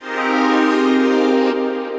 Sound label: Butter Knife Stab, Pad; Ableton; Factory Packs; Punch and Tilt